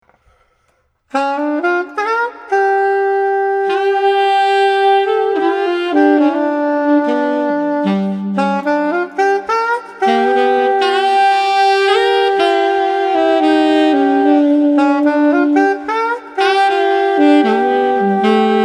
Soprano Baritone Soulful 78

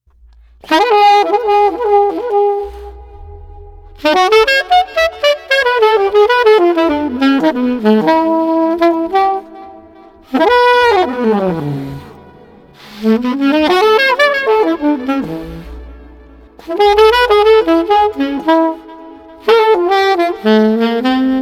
Street Sax Spirited

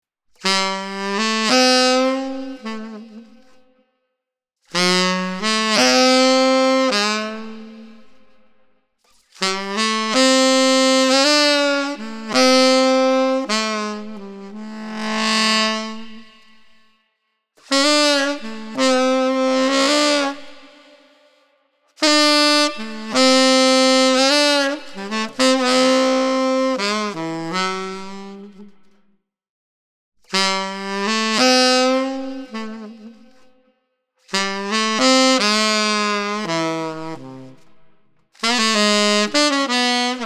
Tenor Sax Salty 65

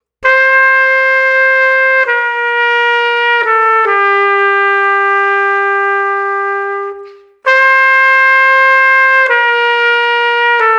Trumpet Sad Legato 133

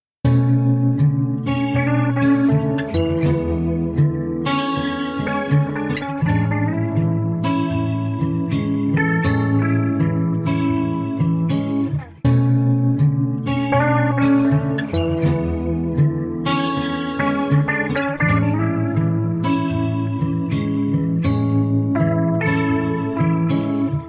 Street Guitars Loungey 80

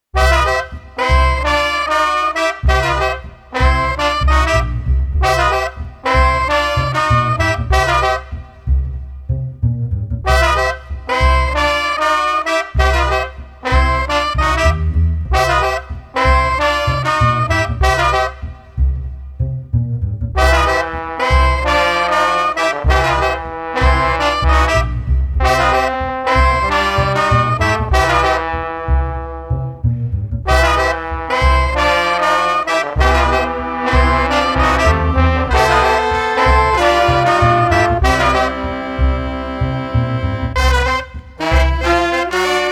Street Brass Combo Psyched no Drums 95

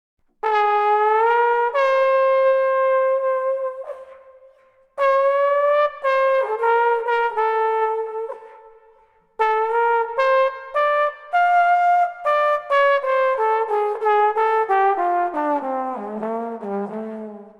Street Trombone Woozy 90

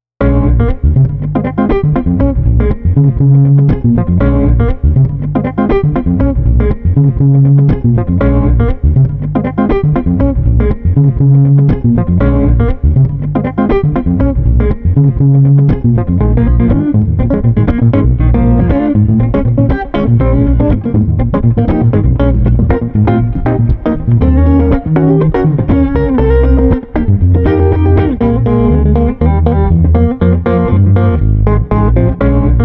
Street Guitar Bass Cool 120

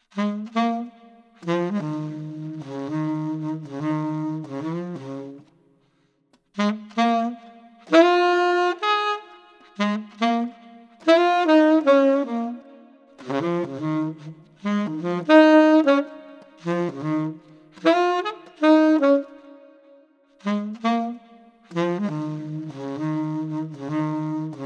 Tenor Sax Dreamy 76

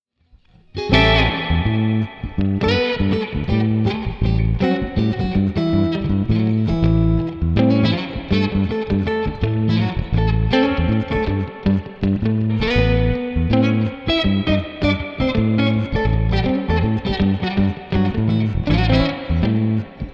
Street Happy Funk Jazz 81

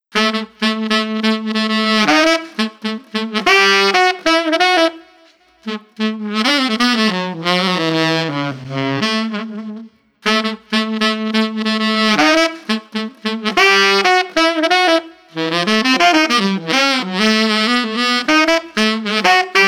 Street Sax Bebop 95

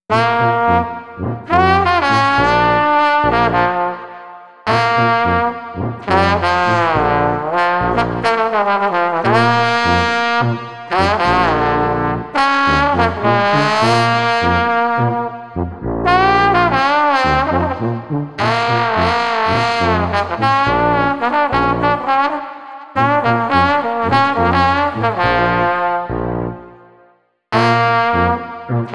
Trombone Tuba Festive no Drums 105

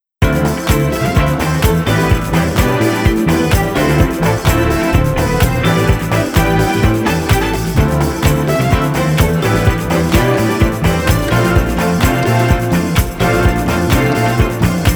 Disco

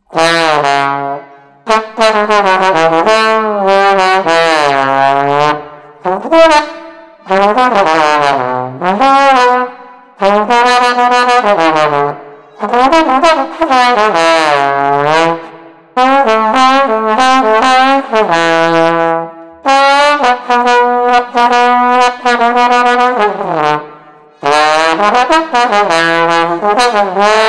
Street Trombone Solo 100

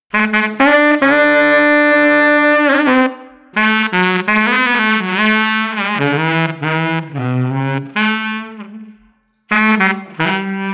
Street Sax Dreamland 92

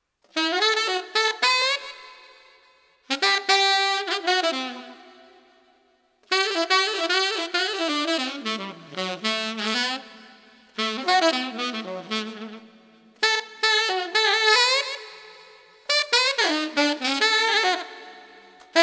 Street Sax

